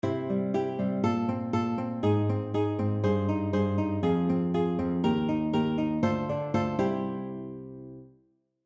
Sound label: basslines